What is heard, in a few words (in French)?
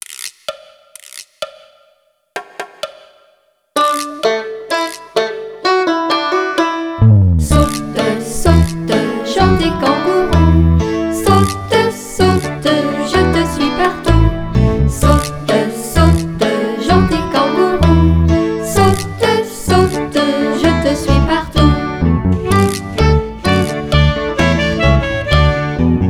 Chanson